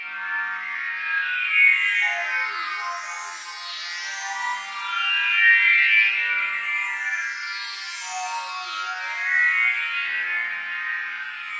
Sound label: twilight star vibe pads, synth and electronic loops